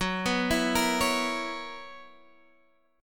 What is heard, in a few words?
B Major 7th Suspended 2nd Suspended 4th Gb